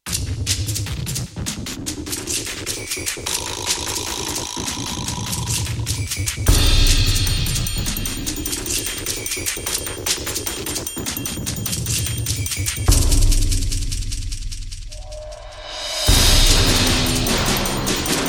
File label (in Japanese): 打楽器の狂乱